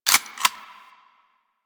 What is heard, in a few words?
Bronco Fire Mech 2D; Warframe; Weapons